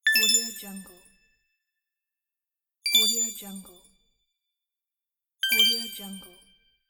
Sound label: magic sparkle; fx-market